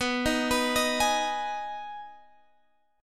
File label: Eb Suspended 4th Sharp 5th B